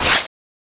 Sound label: strider hit; cstrike; zpl; knifes